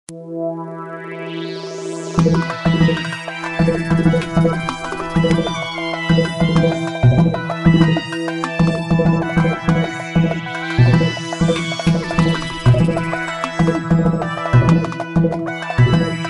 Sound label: Level Load Theme; prince of persia 3d